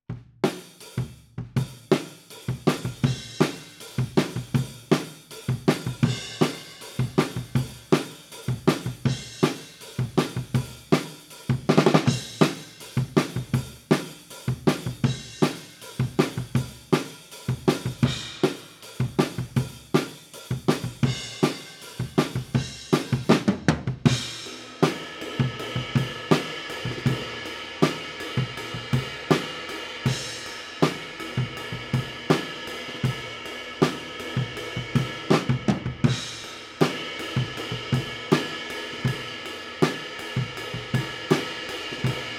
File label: Kit, 160 BPM